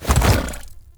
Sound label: Horse Jump Heavy; Vehicles; Foley Actions; Warframe; Duviri